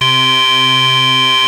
OSCAR C3, synthesizer; Hollywood Edge - Giorgio Moroder Rare Synthesizer Collection

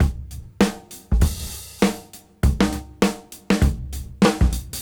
Swing 98 BPM; AMG; Keith LeBlanc Phat Butt Jams

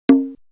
Perc; Coldcut's Kleptomania VOL1

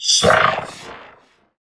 VOX VOX, Electro Vox

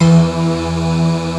MULTI SYNTH

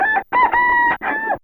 Rooster; Fairlight CMI IIe

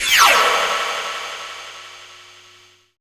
FLY-BY; Spectrasonic Distorted Reality 1

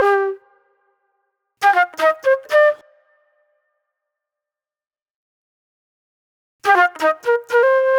Fast Funk Flute

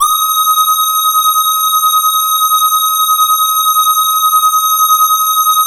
SAW, Synth and Organ; InVision Interactive; Keith Emerson; The Most Dangerous Synth and Organ

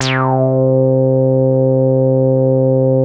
WET KISS; Masterbits; Vintage Voltage; SQ+FX

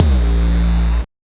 Noise; musicradar; 8-bit bonanza

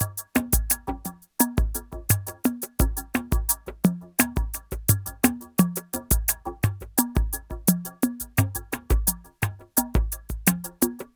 Synthetix, Processed Percussion

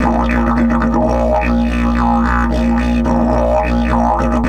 108, Didgeridoo

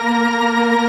Organ, Barton Morton Full

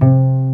WOOD P-H, WOOD BASS; East West - Global Sounds

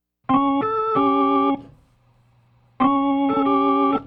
Organ, 70s Analog Funk; Sonic Foundry (Sony Creative Software); What it Is!; Slinky's Place

